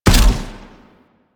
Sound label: Grenade Launch; Warframe; Vehicles; NechroMech; Abilities